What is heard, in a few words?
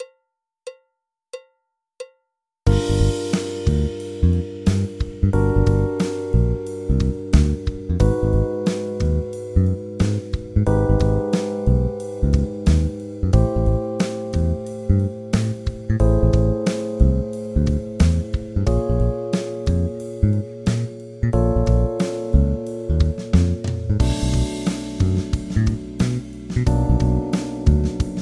MINOR 90BPM 2BARS, saxophone play along harmonic; harmonic minor improvisation; saxophone masterclass